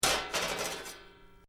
Metal Drag Scrap Clanking; Metal rattles from dragging a metal bar across metal ridges

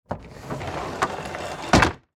Desk Roll Top Open; Miscellaneous Office (Sound Effects:Offices)

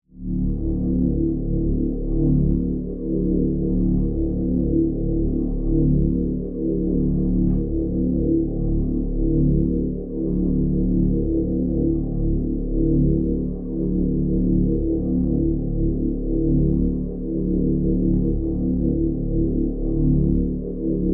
Force Field Heavy, Energy, Source; BlastWave

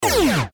button laser descend; Multimedia; Buttons

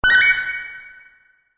Multimedia Correct, beep; Andrew Fleming

